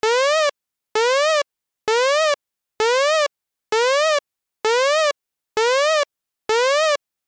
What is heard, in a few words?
LFO key on